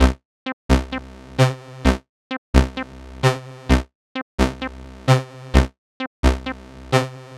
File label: 130 C synth, Synth Loops, Trance